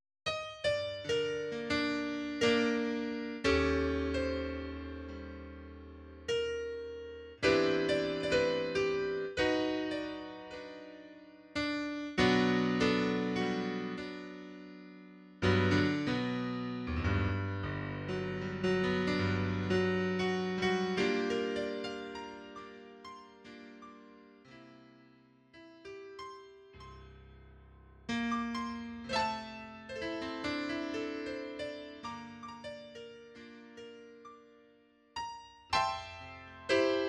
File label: Triade, piano